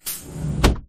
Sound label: door close